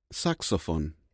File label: musical instruments